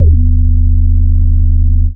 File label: BASS SUB; Big Beat Samples & More